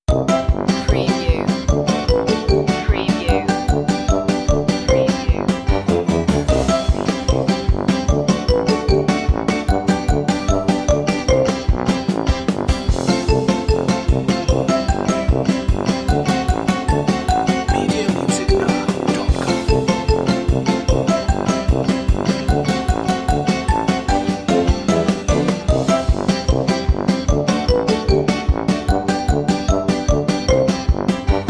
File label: cartoon mood